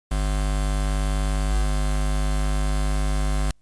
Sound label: Electric guitar jack noise